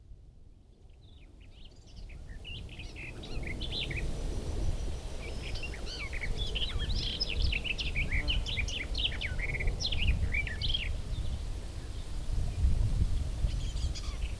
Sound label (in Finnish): lehtokerttu; linnut